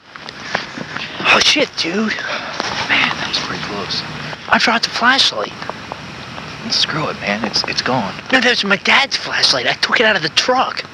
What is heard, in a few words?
Dog